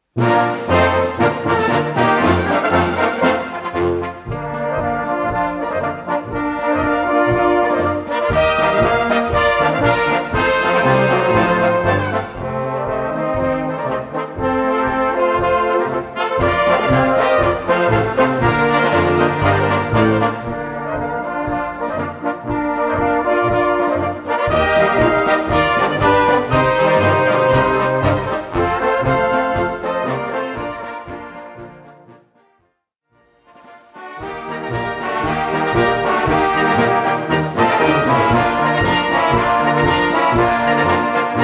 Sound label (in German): POLKA